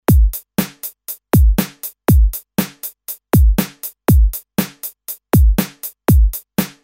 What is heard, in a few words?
Original Beat